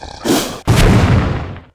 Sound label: Elder war attack